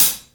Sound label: clear hi hat sound c sharp key, Royality free hi-hat sound tuned to the C# note